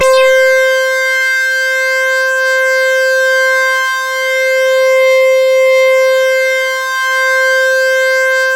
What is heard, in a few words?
EDGELEAD; MORPHEUS